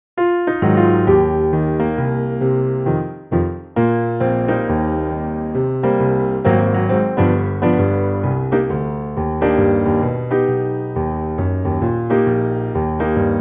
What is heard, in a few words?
Piano Track